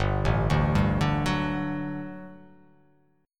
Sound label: G Major 7th Suspended 2nd G down